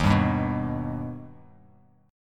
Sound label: Eb Augmented Eb, Bass Standard; strummed; JGuitar